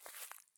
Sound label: walk grass low; Escape from Tarkov